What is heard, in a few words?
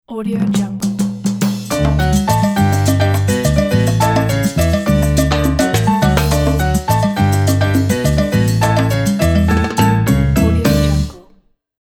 tropical happy latin